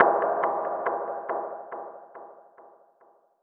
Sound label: ELECTRO FX 140